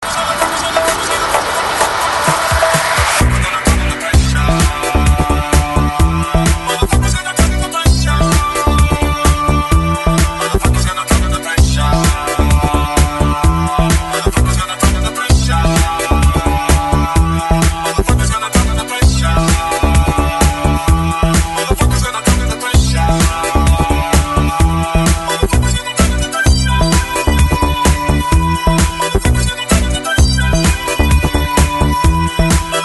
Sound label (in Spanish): RITMO